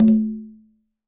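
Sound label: Tumba A Flam Open, Conga; Latin Percussion; Drums; Drum Hits; Ableton; Factory Packs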